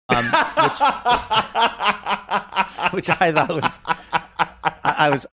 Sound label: having good laugh